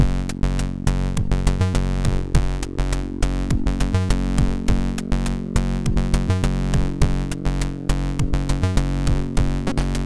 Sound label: Bus Noise intro, MOS6581R4